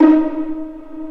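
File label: Vio pizzicato D#3; Digital Sound Factory Virtuoso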